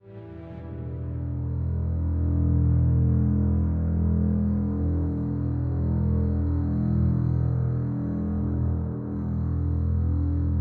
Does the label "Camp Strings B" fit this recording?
Yes